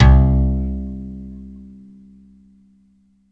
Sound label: c2, Guitar 12Str, EMU E-III; Best Service ProSamples vol.55; Retro Sampler